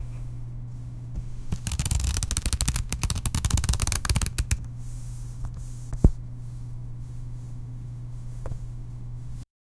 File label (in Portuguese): som de pegar e pousar, card